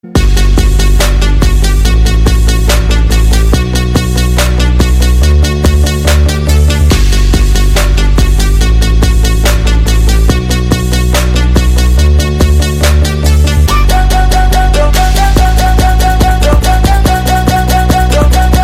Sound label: Marimba